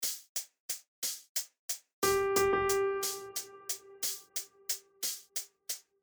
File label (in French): Sicilienne Ternaire